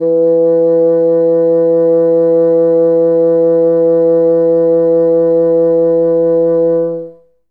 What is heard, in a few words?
Bassoon E2; Best Service ProSamples vol.51; Classic Orchestra 2